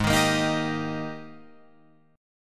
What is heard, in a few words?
G sharp Major 7th Suspended 2nd, Guitar, Open F; 8,10,10,10,10,10; JGuitar